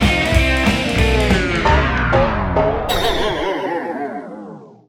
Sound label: Jingle Death